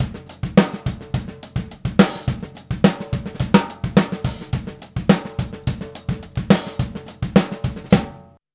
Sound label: Straight Kit, World Pop Beats